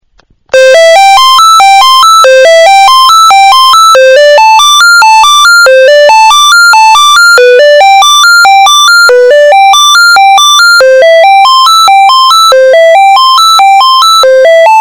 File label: Ring Tone Song